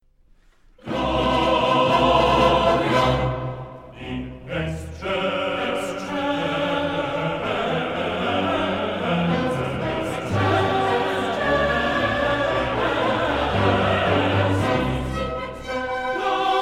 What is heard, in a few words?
Mass In C Minor K 427 Gloria; Mozart